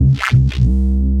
C Bass, DnB One Shots

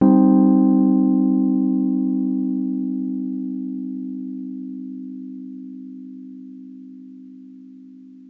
CM 2ND, Chords Hits; E-MU Producer Series Vol. 8 – Platinum Phatt; EP Chords Hits

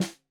Snare 65 Reg Stereo, Deeply Sampled Drums

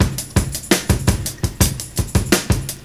OLD SCHOOL, Breakbeats; USB Soundscan vol.46 - 70 s Breakbeats; AKAI

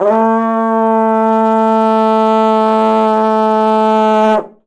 ZangsDung A3, Winds; Polyend; Simulacrum Sounds; 1 Shots; Acoustic and Electric

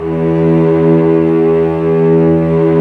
strings, vcs f amb; Roland LCDP13 String Sections

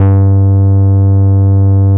G3, Boom da Bass; E-MU Producer Series Vol. 6 – Analogia Project (CD 1)

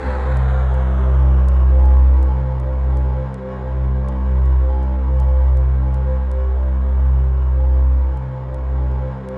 C1, Synth Leads

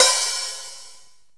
B OPEN, HATS; Zero-G; Funky Elements

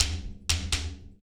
STICKS; ILIO - Ethno Techno

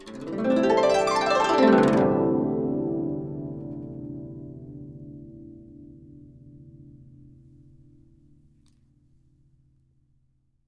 GUCH GLS, GUCHIN; INIS - Opium; GUCHIN-GLS

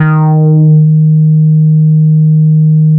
FUZZ D#1; Strictly Synthe1; E-MU Producer Series Vol. 6 – Analogia Project

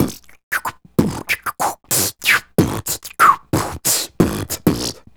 Beat Box, Hip-Hop and RnB Vocals

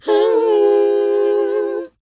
WHOA D#, Vocal R&B & Dance; ILIO; Vocal Planet

